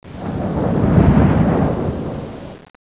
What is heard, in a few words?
ice; Renaissance